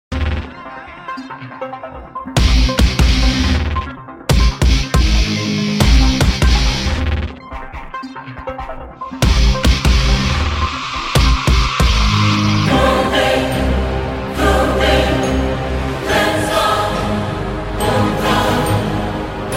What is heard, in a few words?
Bgm; Kuselan